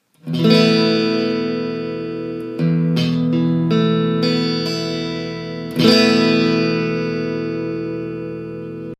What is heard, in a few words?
esus4, guitar